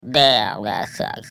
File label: fart reply; Oddworld; Munch